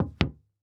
Foley Footstep Cowboy Boots Single Step On Hollow Wood Surface; Foley Footsteps